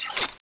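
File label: void pistol scanning off; cstrike; weapons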